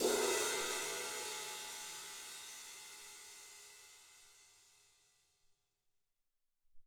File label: Sizl Cym, Alesis SR-18